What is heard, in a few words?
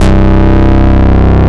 Coffeecup, Bass; Instruments; Basses; Ableton; Creative Extensions